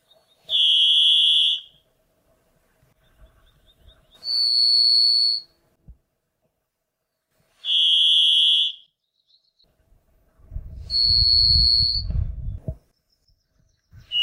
varied thrush

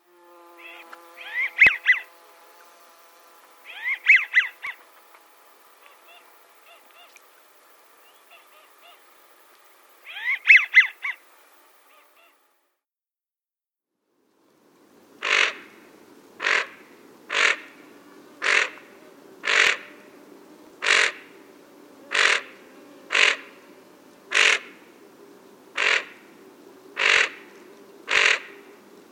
American Wigeon

